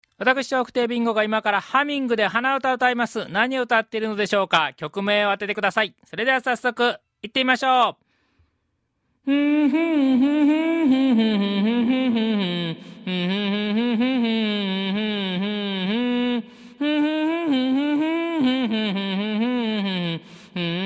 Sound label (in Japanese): hanauta